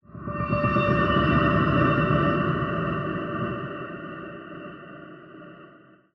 amb prepper rhythmic, ambient

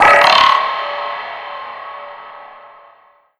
announce alarm, manhack; npc; Half Life Alyx

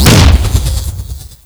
explode shock, roller mine; Half Life 2

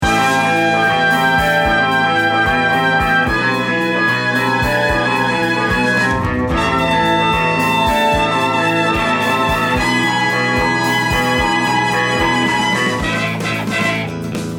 start round

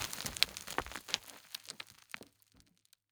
rockfall; ambient; Counter Strike Model Pack; CS:GO; dust2

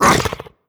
grunt hard, creatures; vox

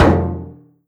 impact, metal barrel

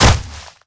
land, footsteps player grass

